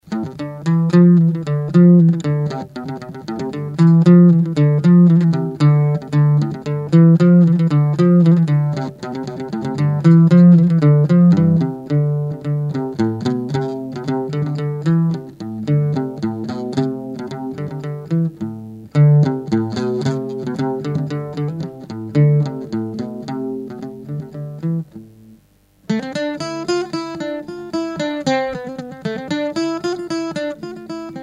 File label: bourree